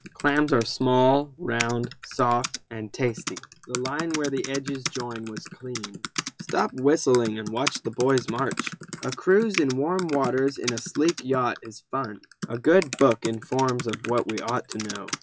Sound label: keyboard